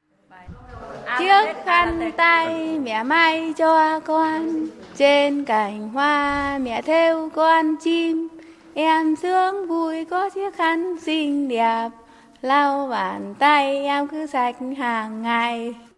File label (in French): berceuse vietnamienne a Nin Bihn